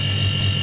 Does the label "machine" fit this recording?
Yes